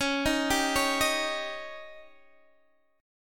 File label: Db Suspended 2nd Flat 5th, Guitar Open D